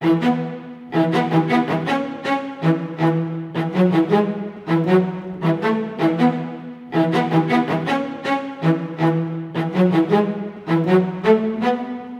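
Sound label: E major, Low Strings, 80; PowerFX; Downtown Orchestra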